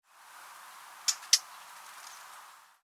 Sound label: Sylvia atricapilla; SETE